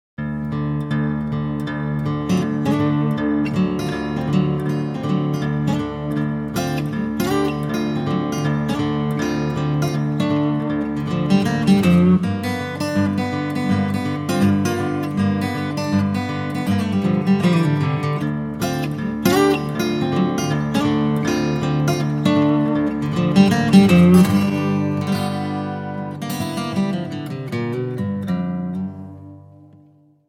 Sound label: blues